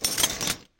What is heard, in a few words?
move; jocly; tafl